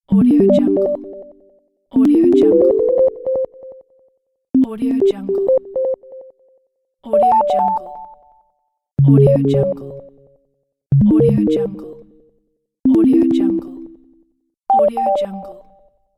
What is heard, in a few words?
level up pack